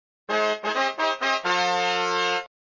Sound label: Music Fanfare (prior to mini playfield mode start), flipper